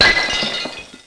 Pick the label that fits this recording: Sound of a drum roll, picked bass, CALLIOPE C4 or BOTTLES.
BOTTLES